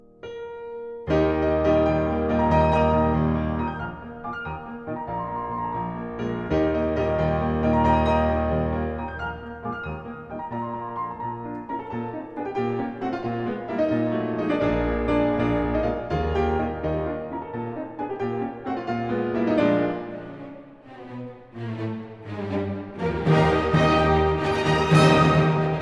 Piano Concerto in E-Flat Major, Rondo - Allegro ma non troppo; Op 73; Beethoven